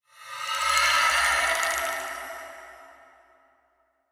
scorpion incap healpad; smite; npc

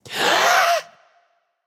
breath, voicepack; smite; jorm

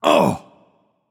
ouch; smite; poseidon

